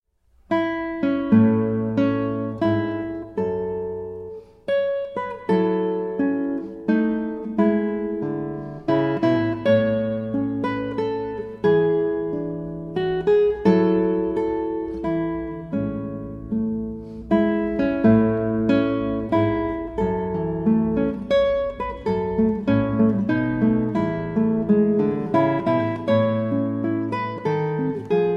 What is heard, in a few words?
guitar; usa; thestarspangledbanner